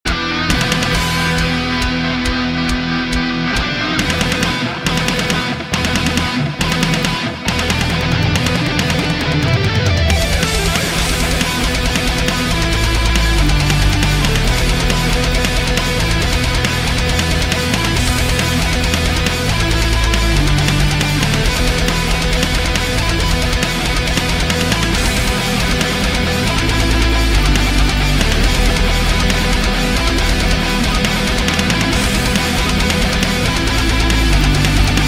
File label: metal